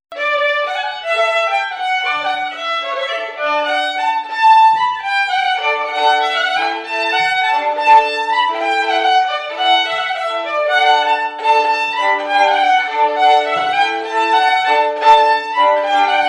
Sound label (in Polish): oberek oczepinowy